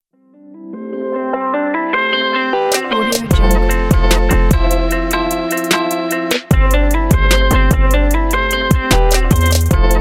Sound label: atmospheric guitar trap